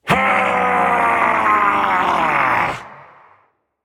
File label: battlecry